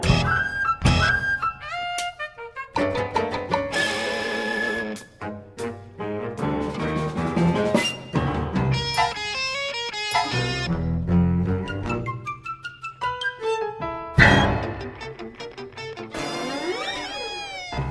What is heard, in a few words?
cartoon funky